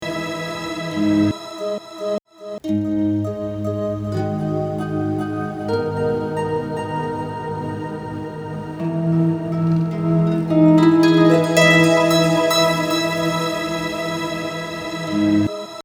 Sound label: Original Up An Octave